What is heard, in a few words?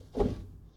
Foosball Center Rotate